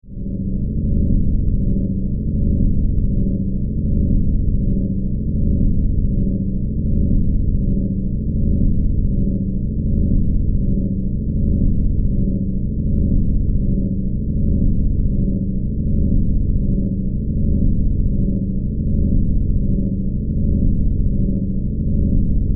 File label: Drone Spaceship, Drone, Spaceship, Interior, Hallway, Power, Source, Flutter